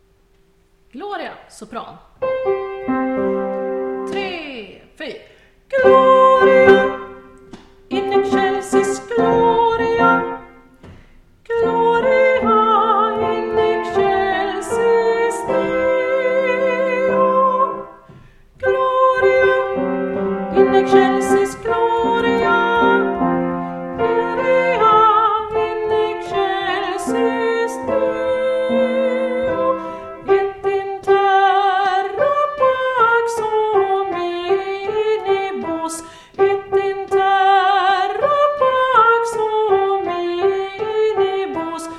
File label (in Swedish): Sopran; MC Gloria